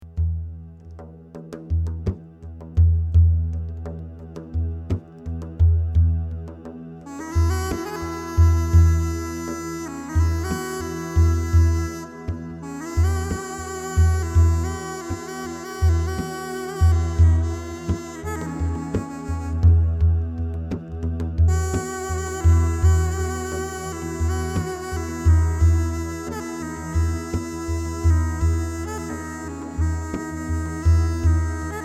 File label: Cane Horn C